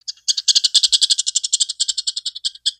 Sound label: Pets Skunk Ecstatic; creatures; Lego